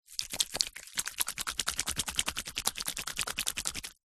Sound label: household hair gel rub squelch in hands; zapsplat